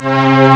B synth; ST-XX Amiga Soundtracker Sample Packs

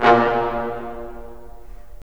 BRS, Roland LA Composer

